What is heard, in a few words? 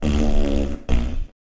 Uh Oh Fart